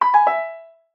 multimedia notification tone piano soft alert; zapsplat

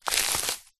footstep single grass thick dry; foley; zapsplat

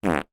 human fart short close

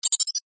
science fiction robotic insect critter chirp; zapsplat

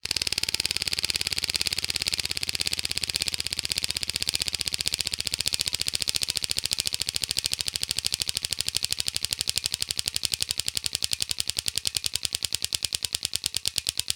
wheel of fortune style wheel spin; zapsplat

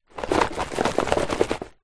food candy inside card box shake; zapsplat

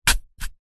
food apple stab with knife; zapsplat

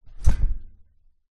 household bunkbed mattress thud drop metal frame

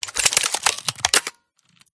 Detailed Mechanical Morphs Mutations; PMSFX

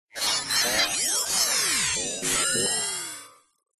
science fiction machine or robot glitch

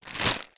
food packet noodles dry pick up

